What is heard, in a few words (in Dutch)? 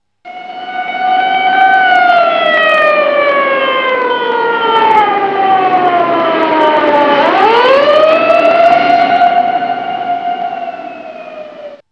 Brandweer, vervoergeluiden